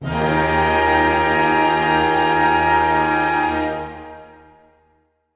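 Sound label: orchestra; Masterbits - Switch FX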